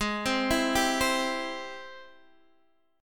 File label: G sharp Augmented Major 7th G sharp Guitar Half Step Down; G#+M7